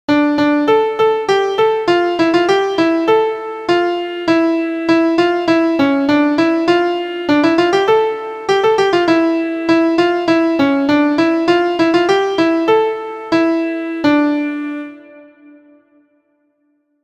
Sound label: Pat A Pan MELODY